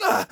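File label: pain sharp, German; Scout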